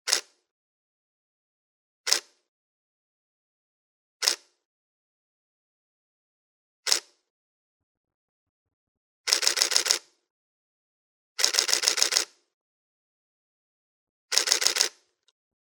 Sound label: Nikon D850